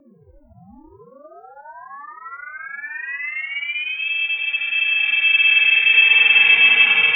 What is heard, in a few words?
Alien Space Sound